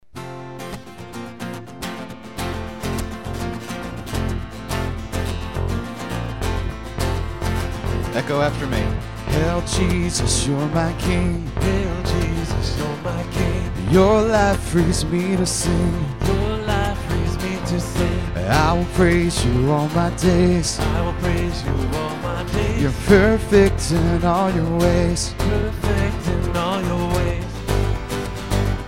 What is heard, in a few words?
Victory Chant